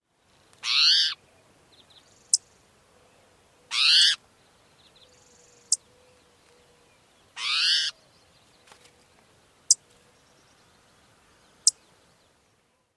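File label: SPOTOW mew calls and chips, spotted towhee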